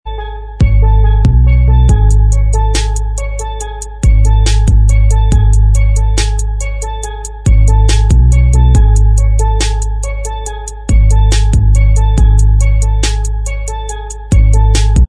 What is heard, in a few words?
Inst Track, backing tracks